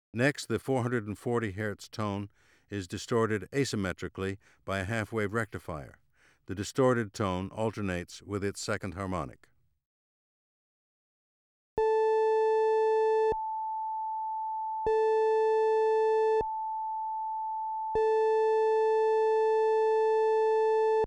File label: Distortion; ASA auditory demonstrations